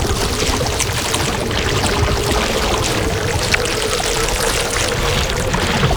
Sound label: Paint Deposit Hold; Gameplay; Warframe; Shadowgrapher